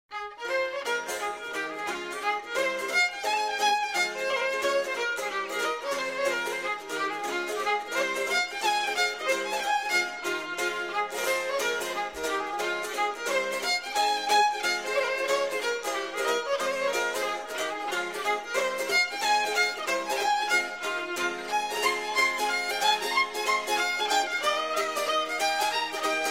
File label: Hornpipes Reels, pipers